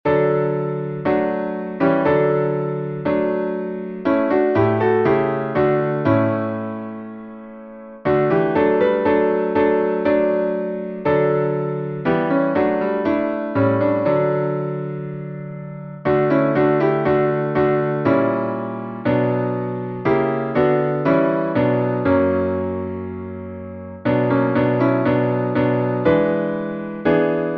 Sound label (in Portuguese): salmo instrumental